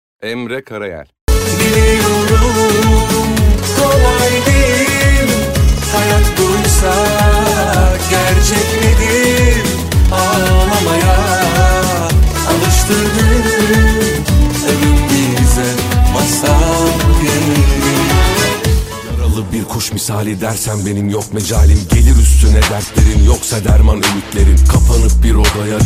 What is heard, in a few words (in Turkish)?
vokal